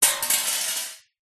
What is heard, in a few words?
metal basket small drop concrete; zapsplat